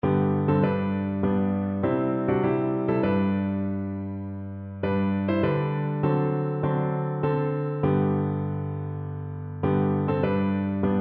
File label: sunshine in my soul, piano